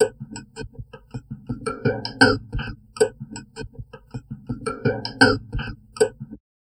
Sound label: TALK, Drum Loops Crazy Processed